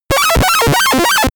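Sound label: arcade enemy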